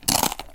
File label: eat; raylib; drturtle